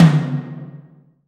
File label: TOM TOM, Roland